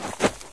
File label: soft shoe generic, footsteps; Source Engine; video game; npc